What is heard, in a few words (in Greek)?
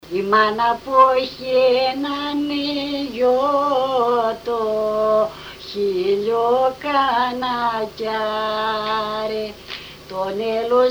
Κάλαντα